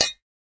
Saucepan Bounce; boomerang fu

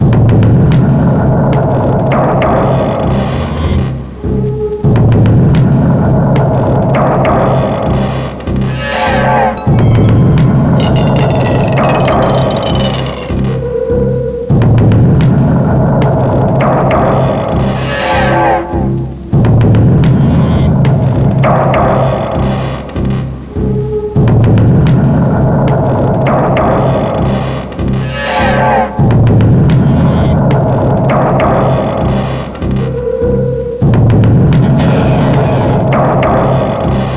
Combat Loop

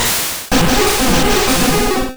Waterfall; Pokemon; Gen 1; attack moves; RBY

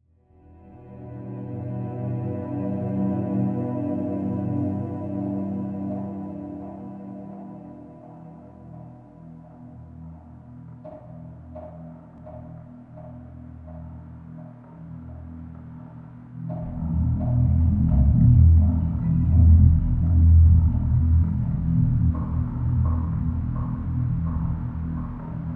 Ambience; Oddworld: Stranger's Wrath

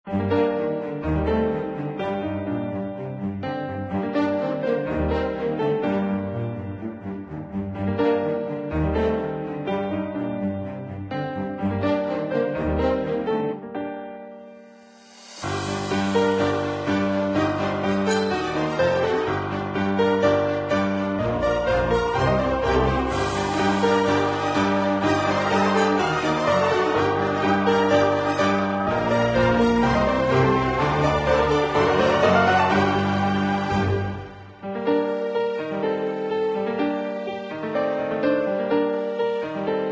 Music Dark Cave Loop; Candy Thieves Tale of Gnomes

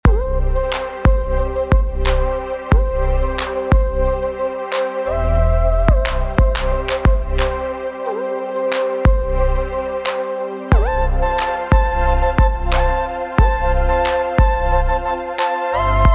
loop drums; windows

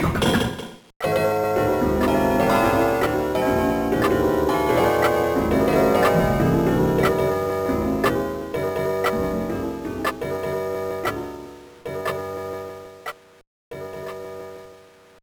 Hurry Up Time Limit; Wario Land IV

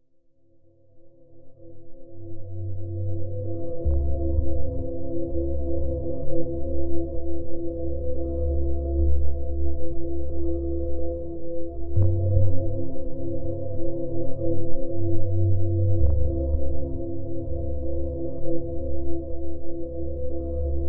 Music Lab Amb

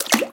Plup; Peace, Death

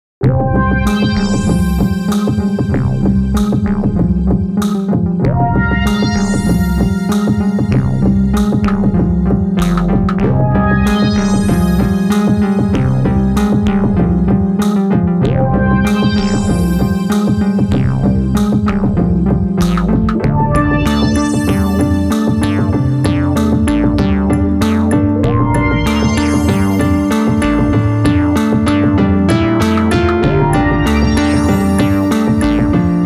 Eerie Battle

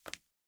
Land Stone

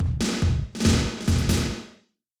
Success

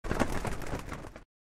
Doublewing Flap; Lethal Company; Windows

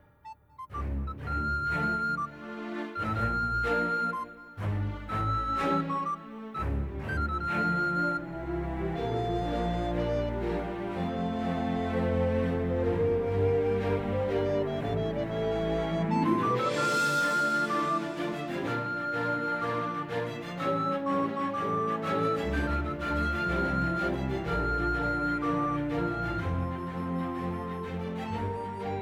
Music Flute Theme; Zak Storm Super Pirate; mobile

